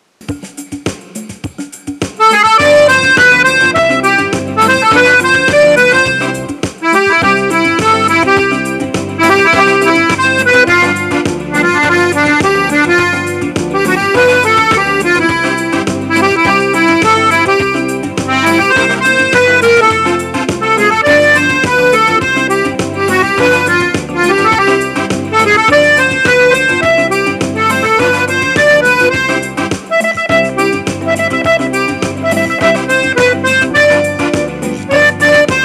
Samba

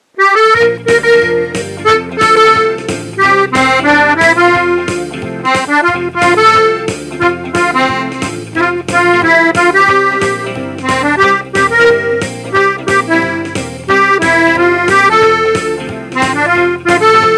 Rock

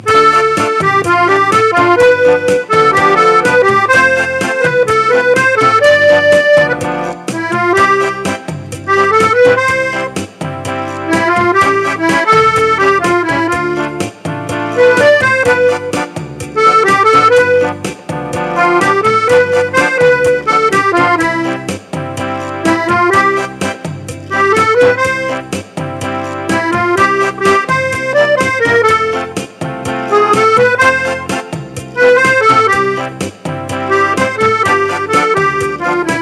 cha cha cha

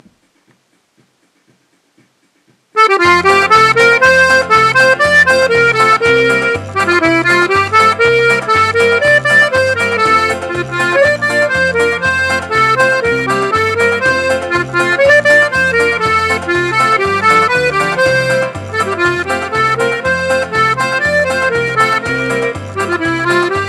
country

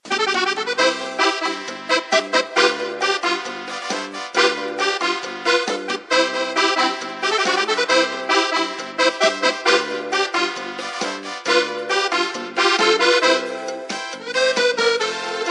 cha cha

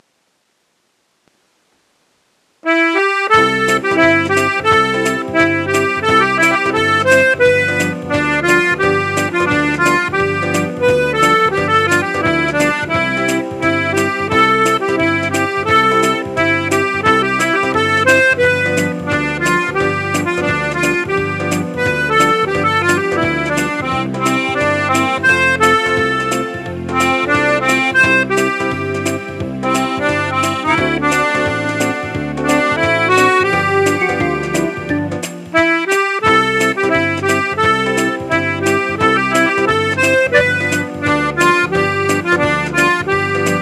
fox

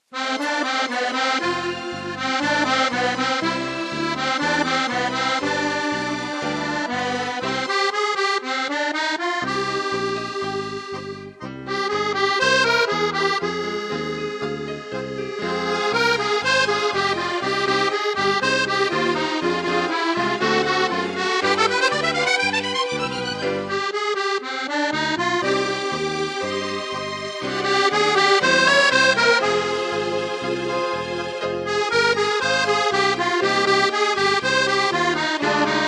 tango

